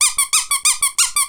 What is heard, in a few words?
Duck tone